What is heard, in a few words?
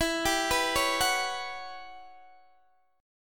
E Minor 6th Add 9th guitar chord, Open D